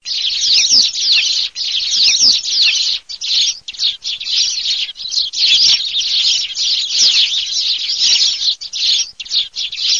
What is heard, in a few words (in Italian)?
PASSERI